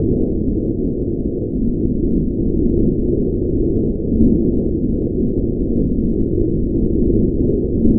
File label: Drone Ambient; Drums & Drones